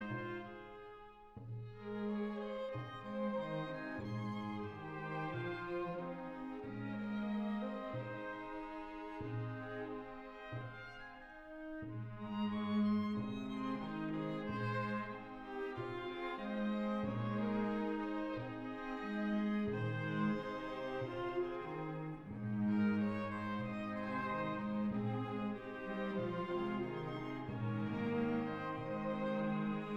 Quartet

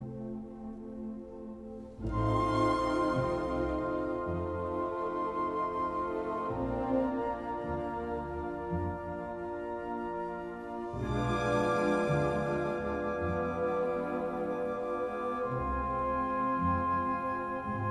Piano concerto; Mozart

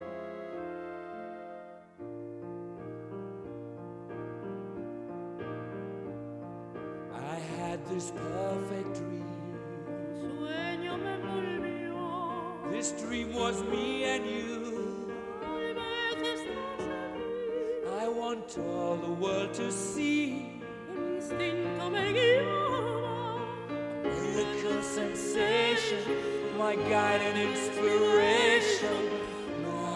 duet

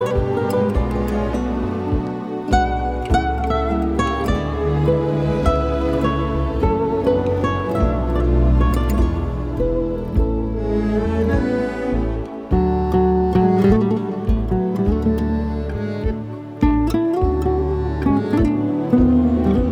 Tango

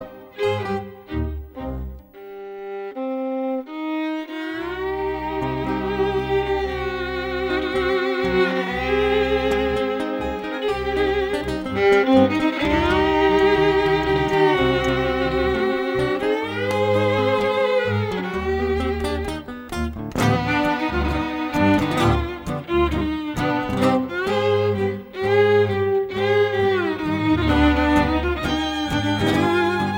Gipsy tango

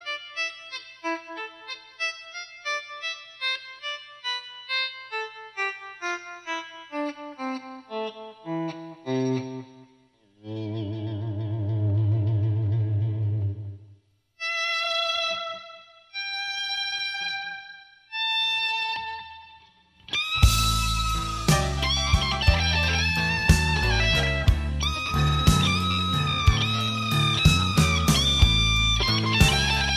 Blues solo

